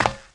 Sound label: Foot Grate; Player; Footsteps